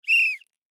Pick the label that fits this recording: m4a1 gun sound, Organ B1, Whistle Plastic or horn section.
Whistle Plastic